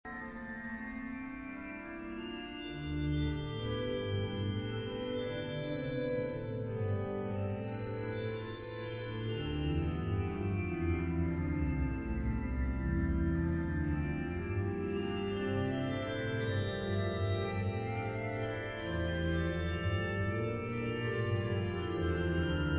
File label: meditation